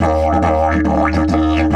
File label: EF 140, DIDGE RIFFS